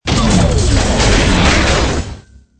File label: death, robot; mob